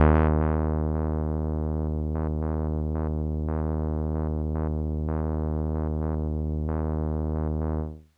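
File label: Kawaii D#5; keys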